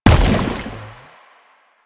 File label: Step Default, Footsteps